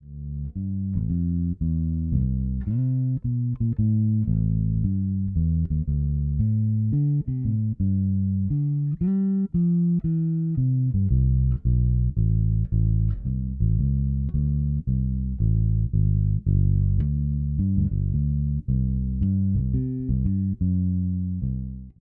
Neck Pickup, Bass; SkarBassOne

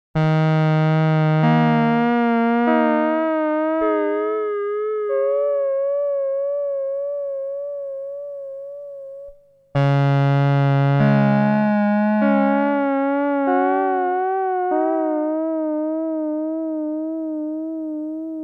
performer dry brass

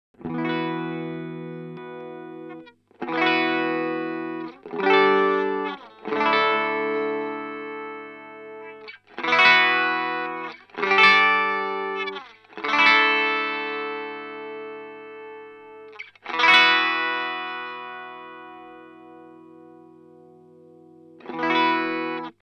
Death by Audio Octave Clang clean Boost

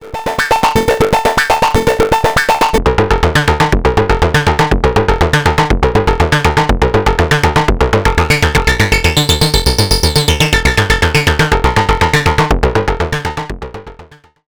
HP LP Sequence; Body Synths Metal Fetishist

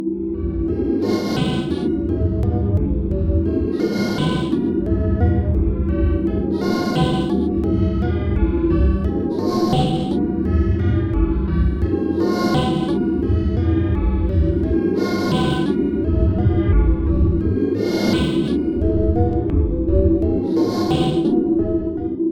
Noises Cosmic Waves Sequenced; AudioThing Hainbach Bundle

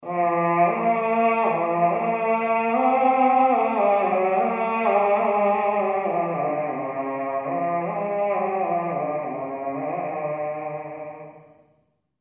Gregorian Vocal

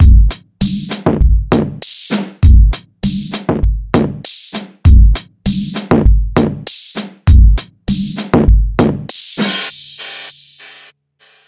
Drums

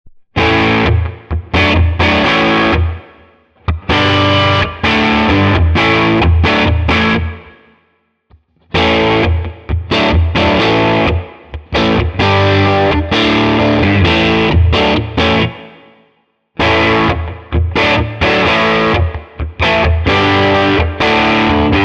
Mid Gain Chords All Positions

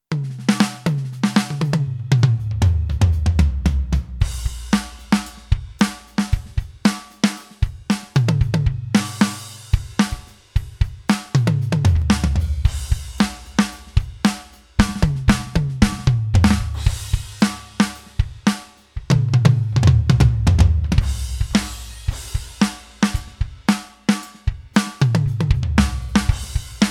PEARL Mimic Pro Masters Kit

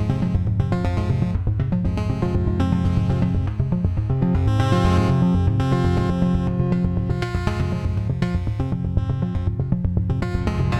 Polybrute 12 Metal arps

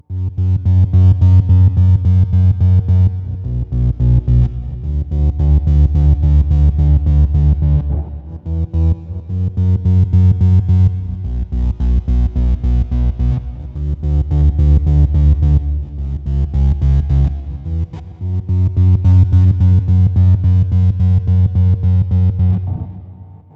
Bass Synth; Boss Katana Go

